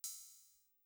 Cym; Sonic-Potions LXR; FilMod

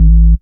DR. LOOP, BASS; X-Static Goldmine 3

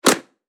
clap, hip-hop drum kit

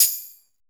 TAMBOID; POP-DNC 2

